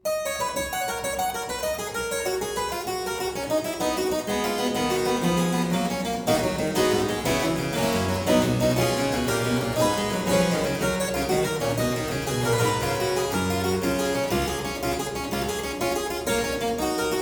Suite in C major Gigue, Harpsichord; Buxtehude; Complete Harpsichord Music

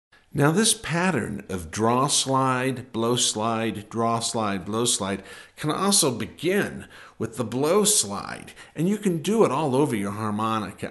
9 Draw Blow Slide Down Riff, harmonica

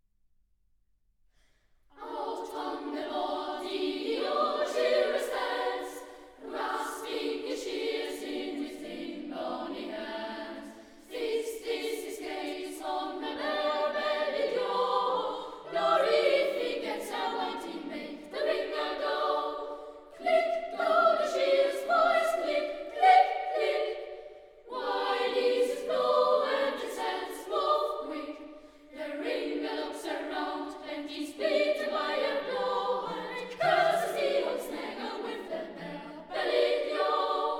Folk Songs; Shearer's song (Australia)

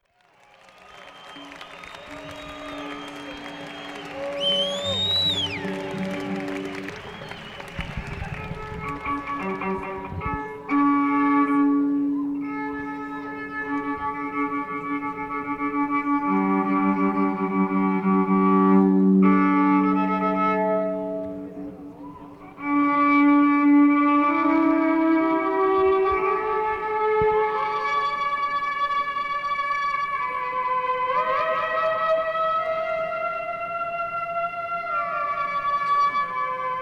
Violin Solo

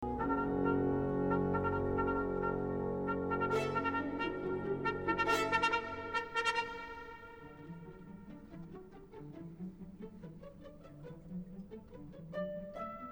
Orchestra; Tempo I

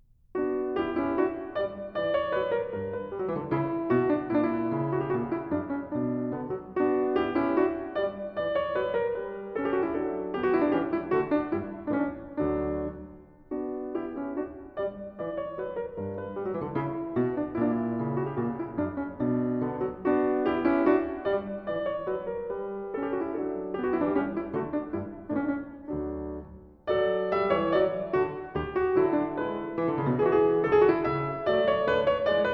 French Overture in h-moll - Gavotte, Keyboard; III. Gavotte I; Bach; Keyboard Works; BWV 831

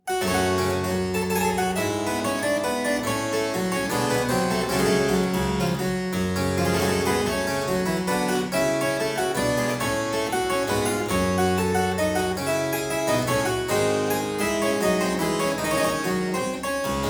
Suite in G minor - Courante, harpsichord; Buxtehude; Complete Harpsichord Music

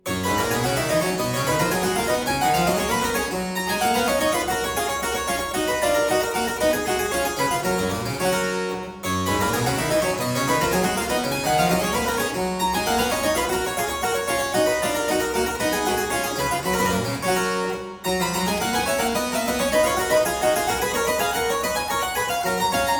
Aria in G major Partita 23, Harpsichord

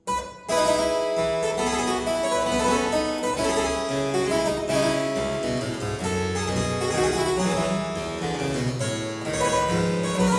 Suite in F major Courante, Harpsichord; Buxtehude; Complete Harpsichord Music

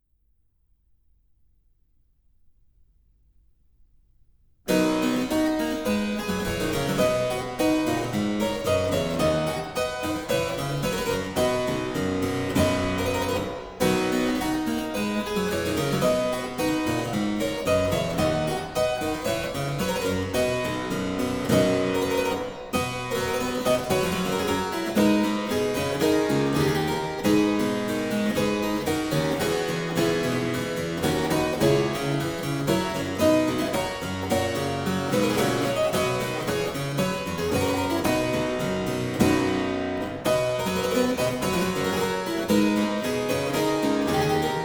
Aria Variations in C Major, Harpsichord

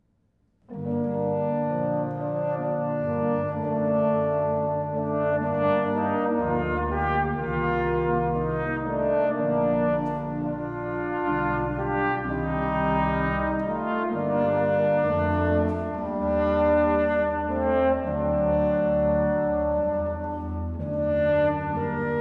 Grave (2 trombones, organ, violin); BAROQUE; Fux; Requiem, 'Missa Pro Defunctis'

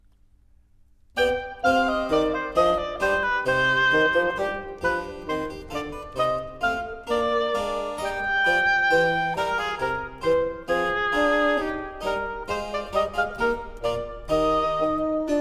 Bourree angloise (Allegro), Sonata in C minor; Handel; Op.1 No.8, HWV 366

